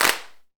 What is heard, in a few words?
PRC CLAP; Club-50 - Foundations; Ext.Snare